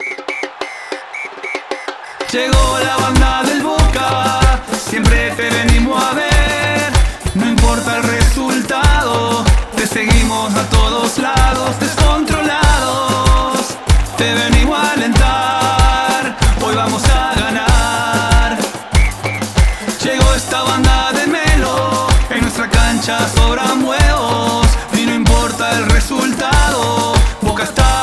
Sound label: jingle